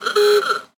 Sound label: Old Horn